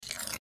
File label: MedicalScientific; Soundfx III